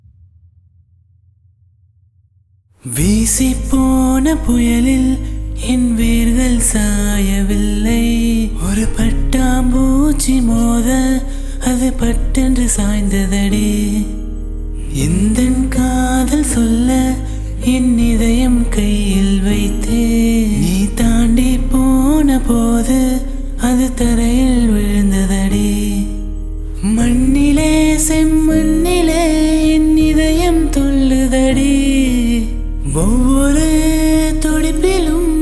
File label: Unplugged Cover